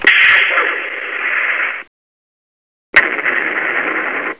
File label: blast mortar, Lazer Tag